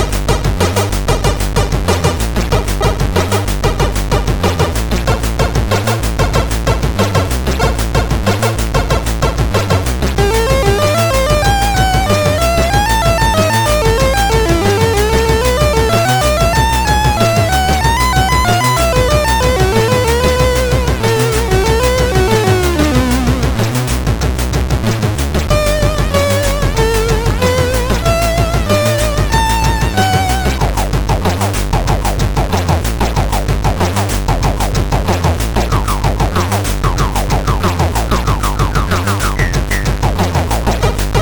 Funk, CSG8580R5; hvsc

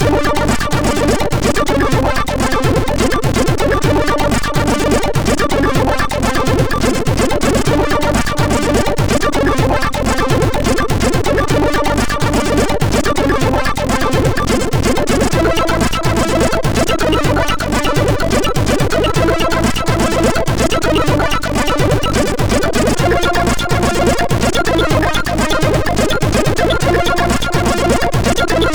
loader, MOS6581R4; Megademo

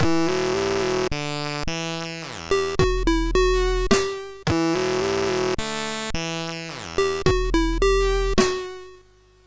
MOS6581; MOS6581R3

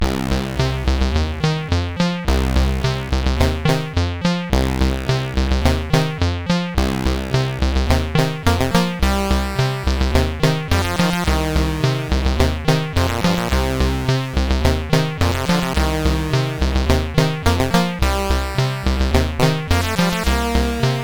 disk version CSG8580R5; sid

